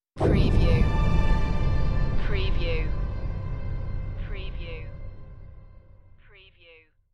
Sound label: Trailer Production Elements - Hit - Distant, Blast, Orchestral, Symphonic